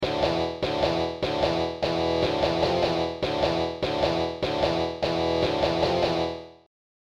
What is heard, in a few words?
Main Riff